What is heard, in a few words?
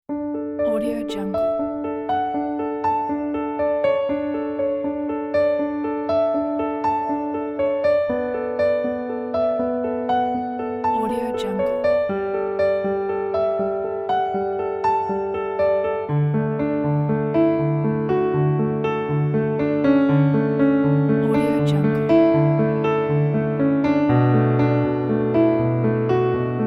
beautiful calming solo piano; pianodays